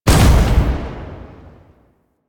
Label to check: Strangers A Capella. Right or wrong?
Wrong